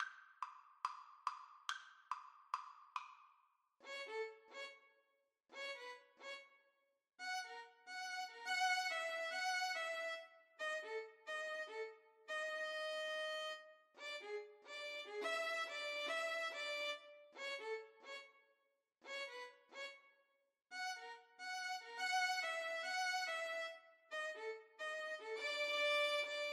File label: rag violin duet